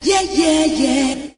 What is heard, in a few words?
yeah yeah yeah sung; ooh ahh; voice